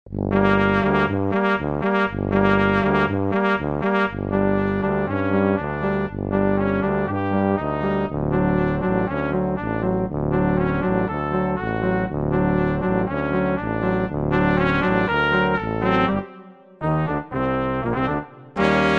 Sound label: tango